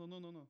vocal

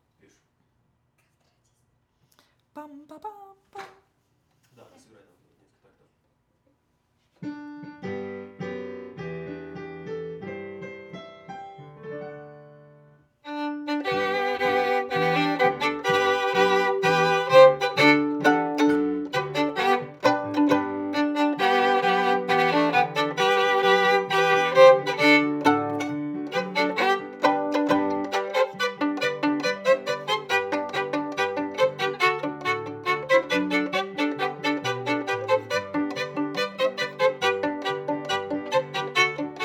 Viola C

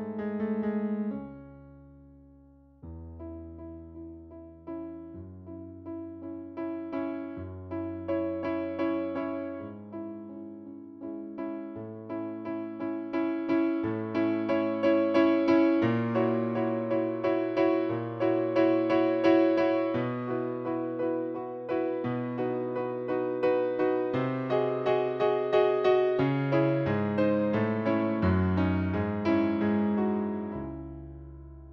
Piano

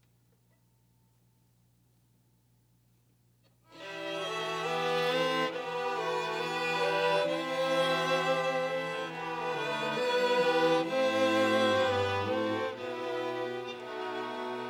Overhead

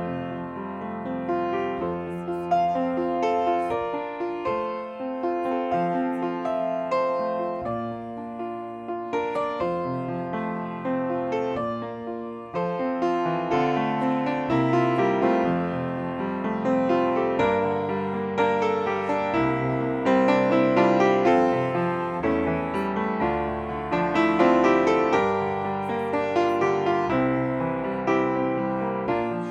piano

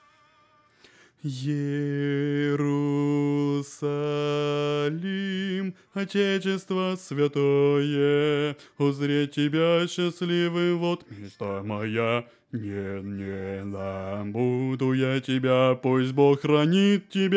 bass

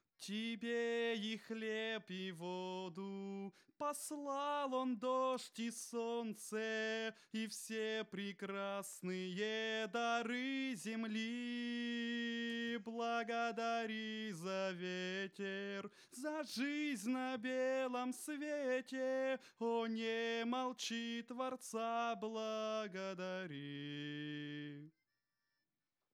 alto dyn

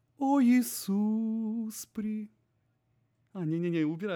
tenor

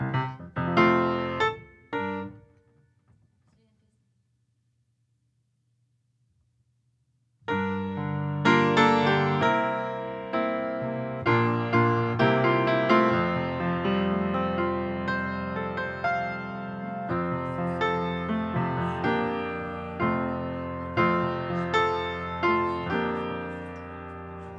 piano

